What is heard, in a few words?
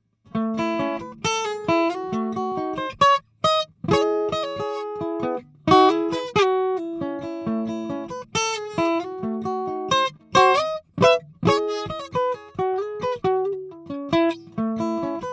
Taylor 314ce Shure SM57, guitar